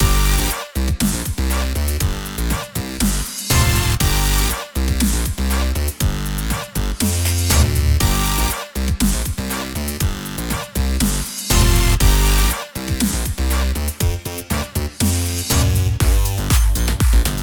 Intro Gameplay; Everybody 1-2-Switch!

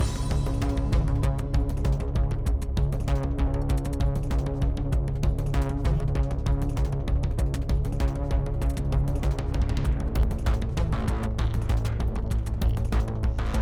Chase 8CH 195BPM; Mirror's Edge Catalyst

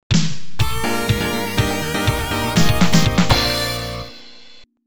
Stage Clear; Active Shoot; X68000

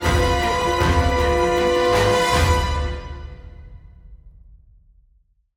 Combat Stinger; Gamescon

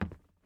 plastic step; teardown ps5 windows xbox series xs gamerip 2022

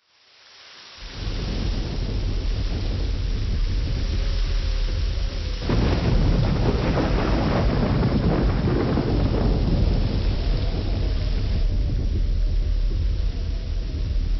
Ambient Galleon Outside; Star Fox Adventures

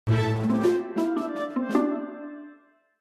Sting Taunt

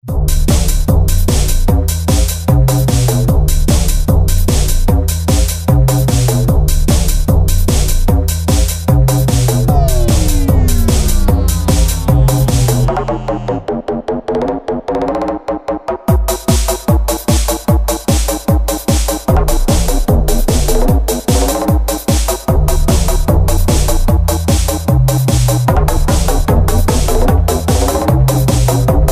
Hardbass music; Russian Life Simulator

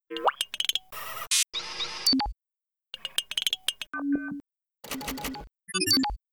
Lobby Menu

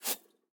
lamp off; teardown ps5 windows xbox series xs gamerip 2022

